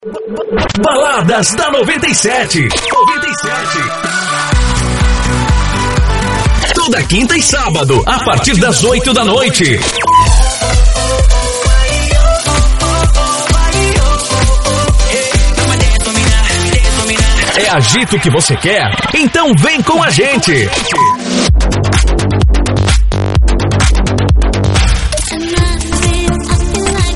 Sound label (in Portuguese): vinheta